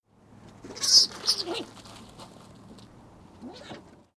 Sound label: Skunk Distress